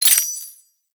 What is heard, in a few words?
Step Spur, Footsteps; Enemies; Foley; Warframe; Duviri; Dax